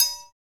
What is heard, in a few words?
PRC TRIAN